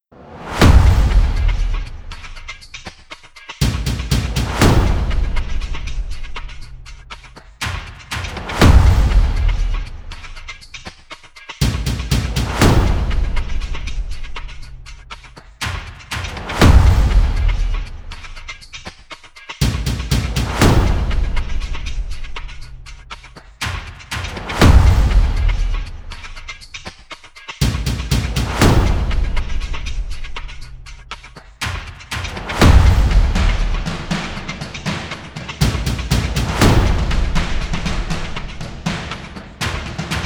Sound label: Premonition Underscore, Percussive Drama; Narrative Mind